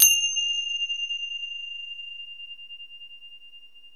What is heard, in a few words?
CYMBAL, PERCUSSION; AMG - Vince Clarke Lucky Bastard